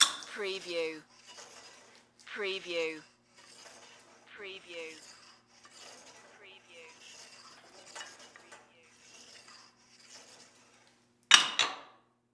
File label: Weight Bench Pull Down; sound effects bible